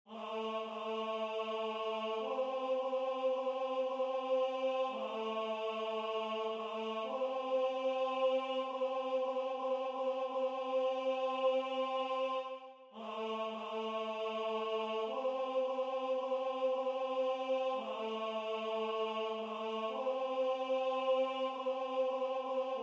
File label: Tenor; Der Lindenbaum; F. Schubert